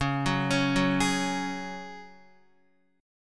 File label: Db Suspended 4th, Guitar; x,4,6,6,6,4; 4F,4A,5C,5G,5C,6E; arpeggiated